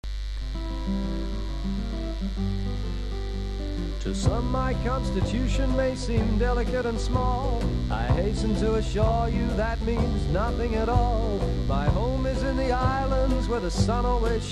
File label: Ukelele Love Song